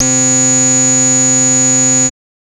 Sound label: Anasound Long mid C, Analog Single Notes